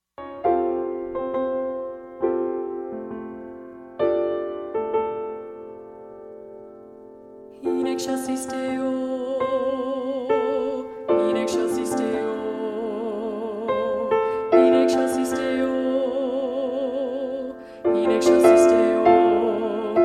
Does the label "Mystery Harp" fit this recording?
No